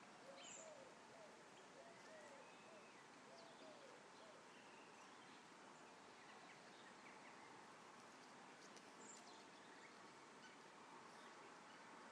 christmas morning birdsong

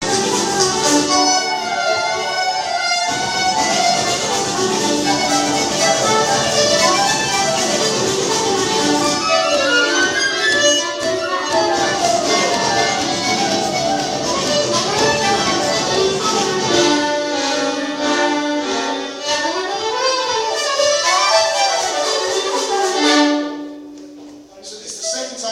rehearsal traditional music

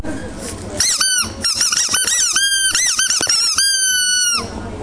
squeak

